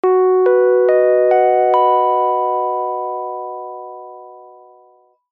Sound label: public places announcement tone bell dry